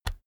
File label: keyboard external key push